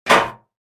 door; maoudamashii

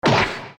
battle; maoudamashii

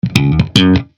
instruments bass; maoudamashii

